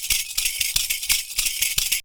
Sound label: PERCU; Univers Sons; Raricussions